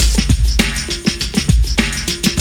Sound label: GIANT LOOP, BREAKBEATS